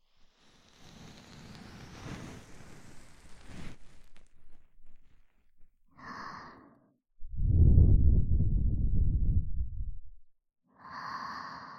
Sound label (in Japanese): 【右】浅め耳かき, ASMR